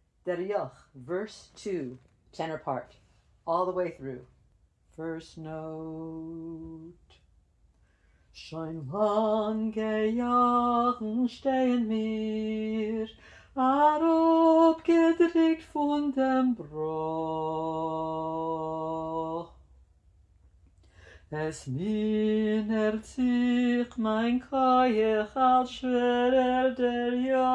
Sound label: verse 2 tenor; all the way through; Der Yokh